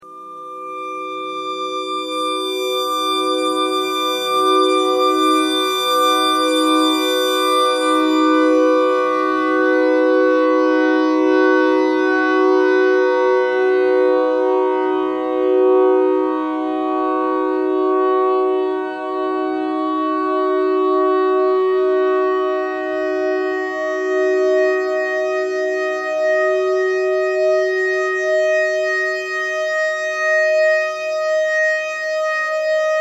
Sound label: clarinet notes e thread noise